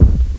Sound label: Bear Feet; Playable Tutorial Level; TR1